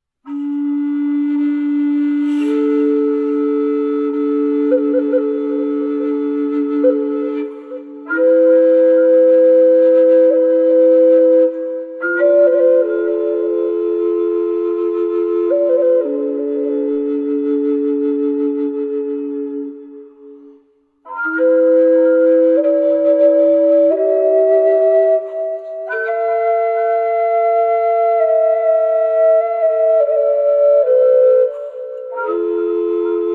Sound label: clayflute; マヤ・アステカのクレイフルートを吹いてみました; マヤ・アステカの遺跡から出土した土器の笛（レプリカ）です。